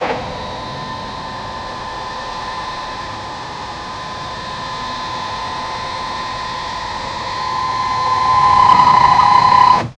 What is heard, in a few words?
gantry crane winch